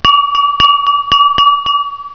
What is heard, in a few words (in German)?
klirr